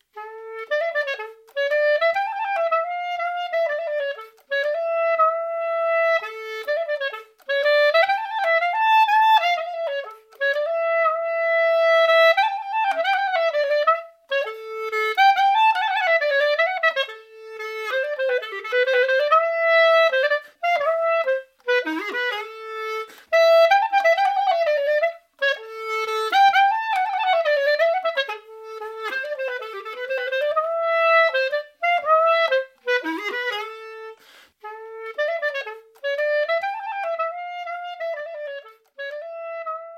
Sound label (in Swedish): klarinett i orsa polska; efter jamt olof ersson